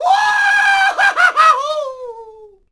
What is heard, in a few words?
man fall by; Sid Meiers Pirates; XBOX